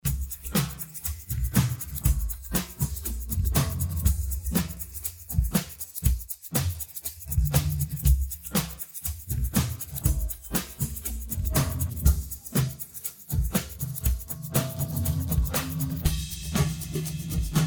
nur percussion

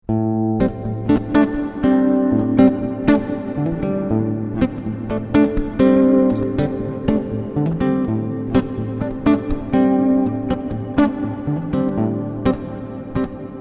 Hall

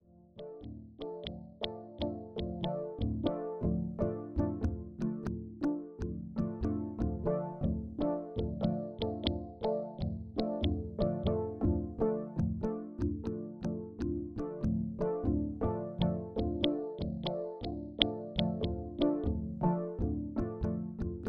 Rossum Electro Music Morpheus Preset Seq ohne CV

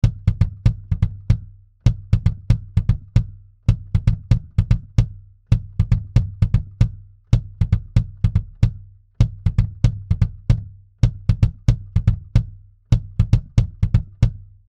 1073 Preamp Vergleich BAE 1073 BD 30 dB

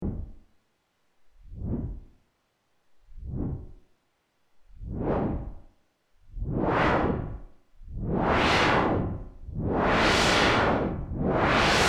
Rauschen mit Modulationen

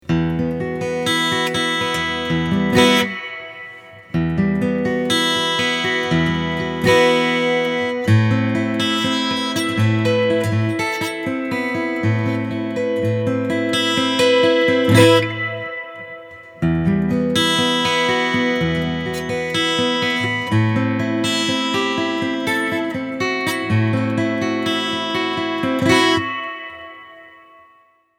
Lava ME2 mit Reverb

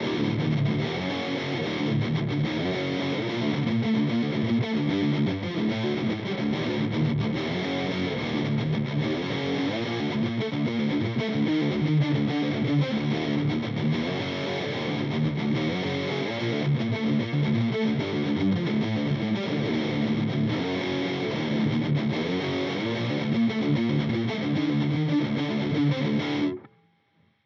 HX Stomp MB Dual Rectifier Kompressor; Vergleichstest

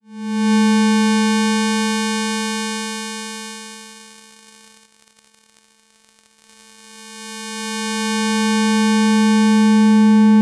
E-RM Polygogo Cycle On Parameterfahrt Teeth

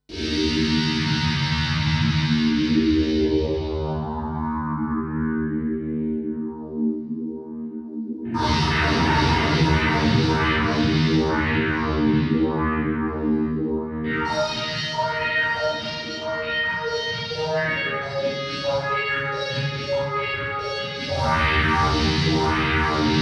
Korg SV-2 Psychedelisch

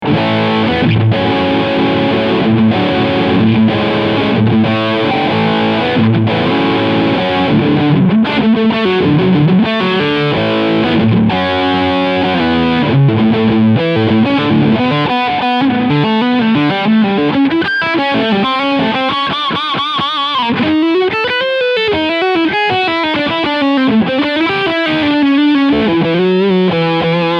Leadsound Steg HB

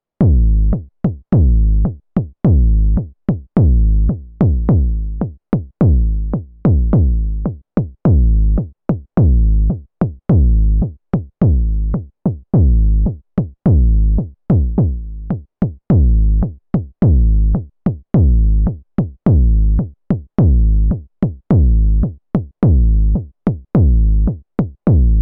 Volca Kick Urwald Laut

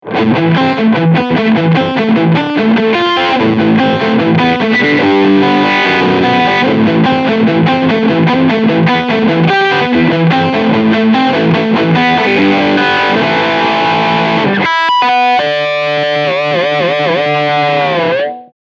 Riff Full Gain OHNE Hall

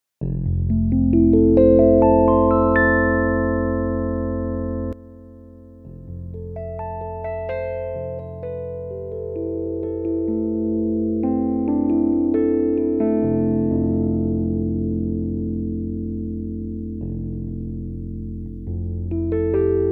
Flanger Bypass LFO Dreieck FB zwischen 0 und 5

